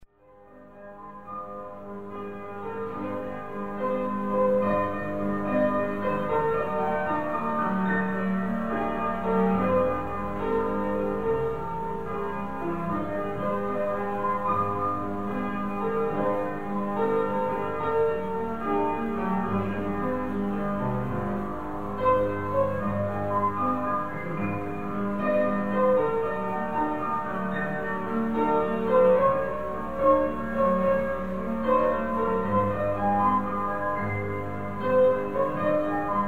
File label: In the Sweet By and By Piano Solo